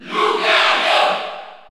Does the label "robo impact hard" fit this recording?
No